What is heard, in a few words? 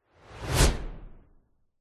transition whoosh fast; sound design; zapsplat